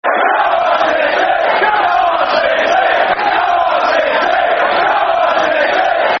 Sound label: Football Chants (Soccer Songs); Come On City; Man City Mcfc